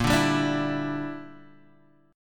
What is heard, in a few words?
Bb 6th Flat 5th Bb, Guitar Open F; Bb6b5 strummed